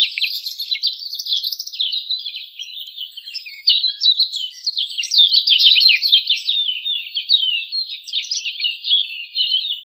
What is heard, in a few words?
birds saying hello, chirp